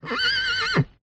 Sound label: real horse alone